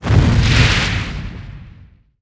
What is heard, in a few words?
Shoot; Tony; TR3; Playable Tutorial Level